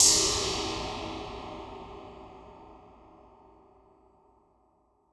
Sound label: Noise Verb Decays